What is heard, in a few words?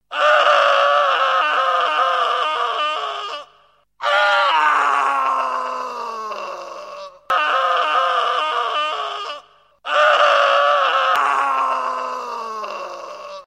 Cry Of Pain